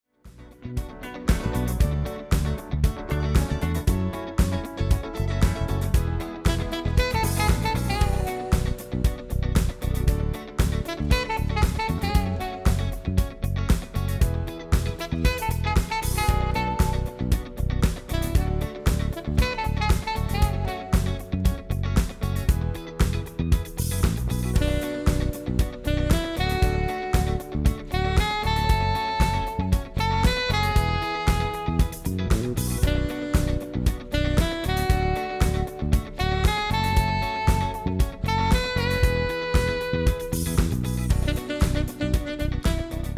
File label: Sax